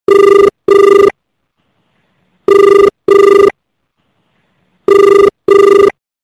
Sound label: Default Call Ring Ringtone